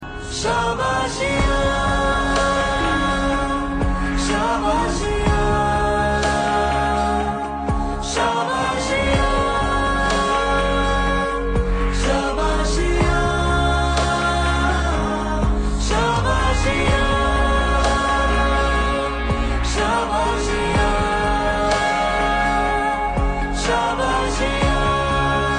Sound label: Female Ringtone